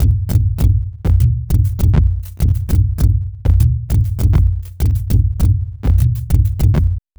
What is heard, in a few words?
Dense Gravity Groove, Beats; Sonic Foundry (Sony Creative Software); Ma Ja Le Ethereal Textures